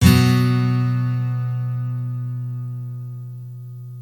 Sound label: B power chord